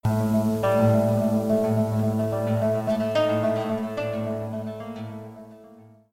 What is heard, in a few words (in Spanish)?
cortina duo guit; nueva cortina duo b